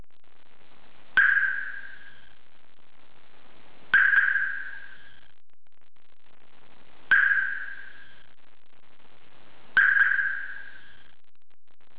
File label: siren; オプション高級サイレン; キーレスのロック・アンロックに連動